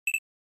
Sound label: Shooter Damage